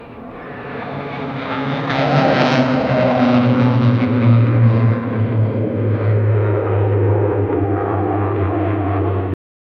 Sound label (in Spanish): sonido de un caza volando